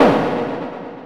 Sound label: hit, sfx; anvil